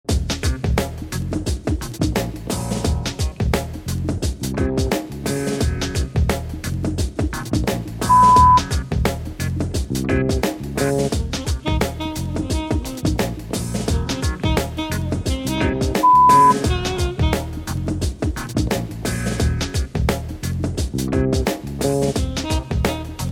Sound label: Groove